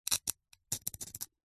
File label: foley stone rock carve engrave scratch with small sharp metal spike